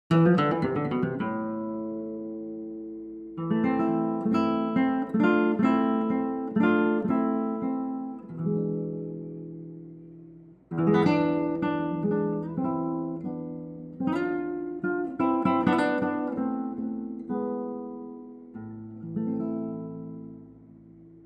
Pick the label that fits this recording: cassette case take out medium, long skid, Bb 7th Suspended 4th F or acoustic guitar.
acoustic guitar